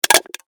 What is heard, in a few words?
Foley Soda Can Crinkle Squeeze; Crinkling (Sound Effects:Objects)